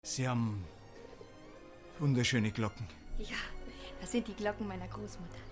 glocken

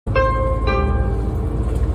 bus ansagengong haltestellengong; rostock; rsag

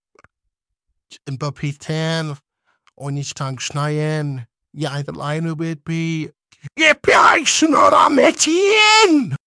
schreit

